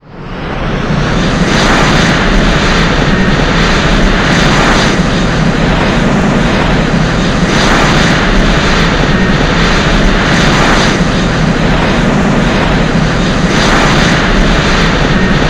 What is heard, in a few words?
reverser, 737-800